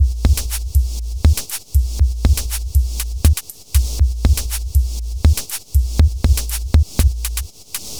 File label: Attention Beat, Composite Beats; Sonic Foundry (Sony Creative Software); Myths of Technology